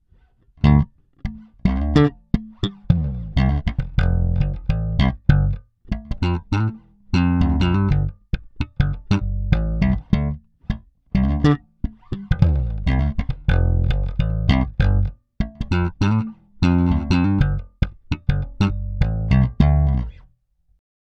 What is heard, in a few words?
Peavey Stock Slap Tone UP; peavey shootout audio samples